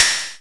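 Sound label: MS20 HAT OPEN; INIS - Funky Xtreams; MS-20 KIT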